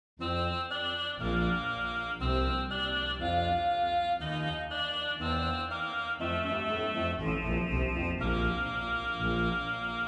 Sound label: organ reeds